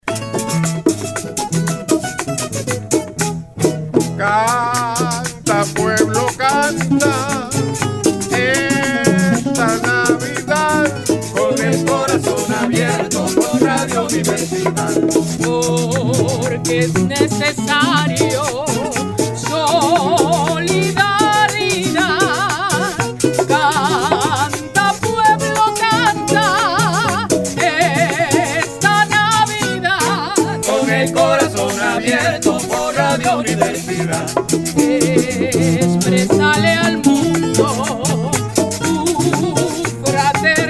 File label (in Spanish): Jingle Navidad